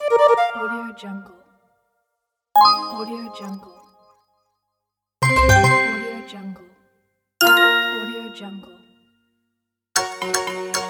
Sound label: click sound pack